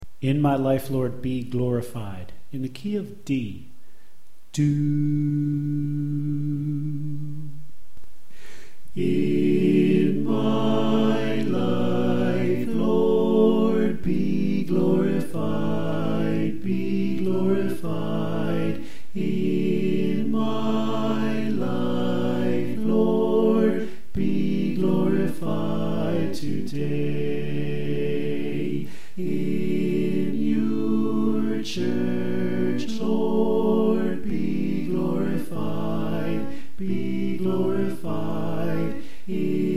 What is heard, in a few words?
Alto Left